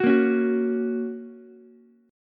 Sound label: F sharp Major F sharp Ukulele; strummed; JGuitar